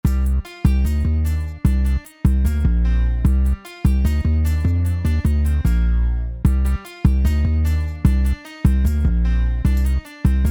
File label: Flight 150BPM Explore; Dragons Destiny OST